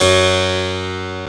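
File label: Harpsichord; CMI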